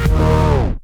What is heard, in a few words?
pan impact world; tron mod; weapons